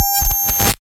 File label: Signal Scramble; FREE SFX PACK VOL 3